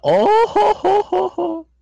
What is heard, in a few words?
ohohoohooh